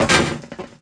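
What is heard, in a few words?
droid probe bodyfall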